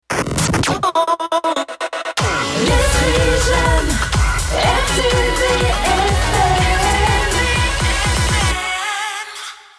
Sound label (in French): Jingle Chanté; RTVFM; carpentras